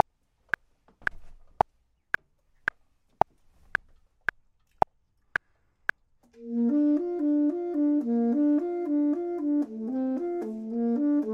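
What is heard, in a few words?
bottom, Saxophone and Clarinet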